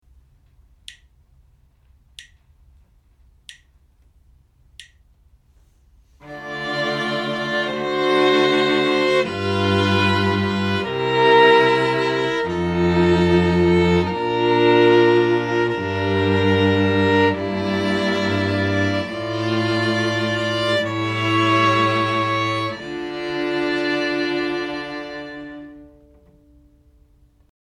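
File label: harmonic dictation, string quartet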